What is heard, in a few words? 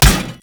Hammer Impact Armor Damage; Weapon Impacts; Player; Combat; Tuvalkane Hammer